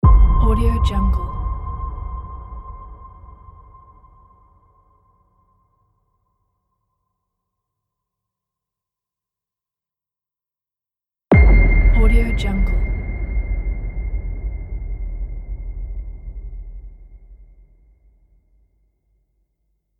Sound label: cinematic piano plings